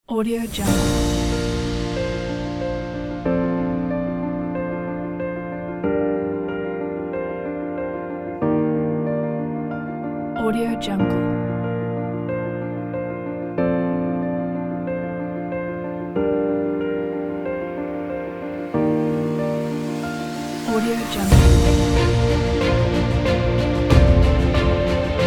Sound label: piano beautiful background